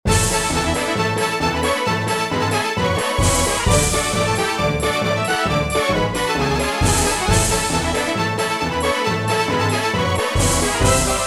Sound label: Figure Skating